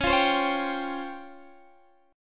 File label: Db Major 7th Flat 5th Db down Guitar Standard; strummed; chord shape; JGuitar